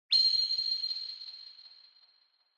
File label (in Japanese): Onoma Flash High Long, 「キュピーン」「キラーン」といった、光る表現に適した単発系の効果音